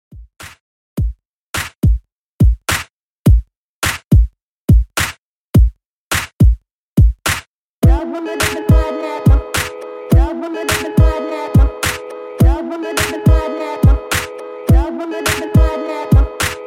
Fly Girl Redrum 105Bpm

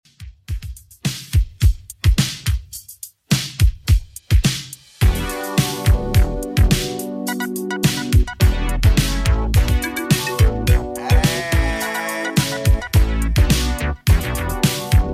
80s Redrum 106bpm

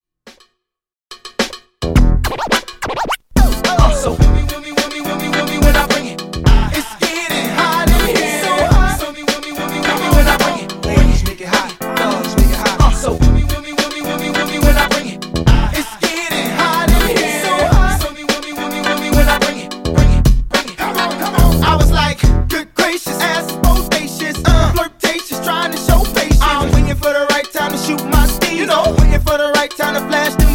Funk Bass House Segue Dirty 107 124 Bpm

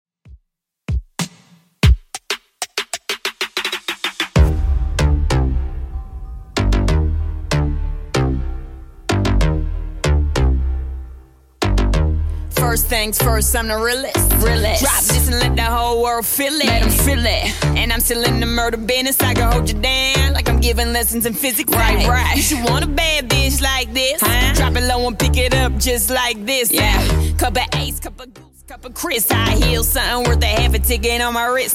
95 bpm

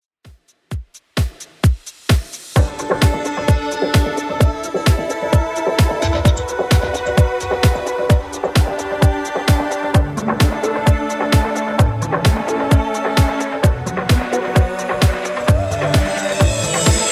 90s, 130bpm

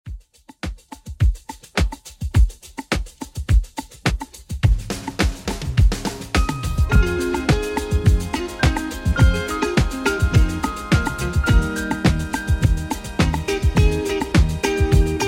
70s Redrum 105bpm

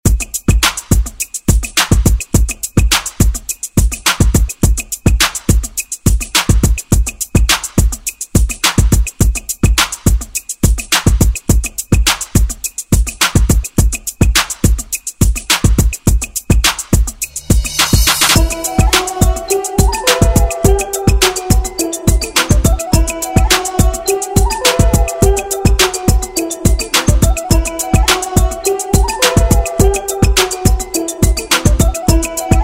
Top40, 105 120

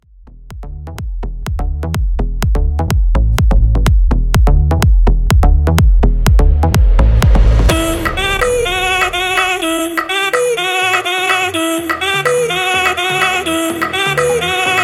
Tribal House 125 Bpm

